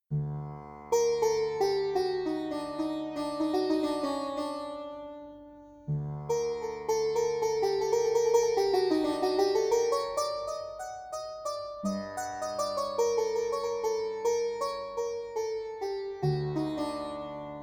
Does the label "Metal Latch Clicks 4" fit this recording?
No